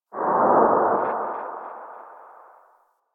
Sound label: Operator Wraith Void Move; Warframe; Focus; Powers; Wraith Movement